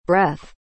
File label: breath